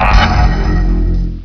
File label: ITEM UP; CHASM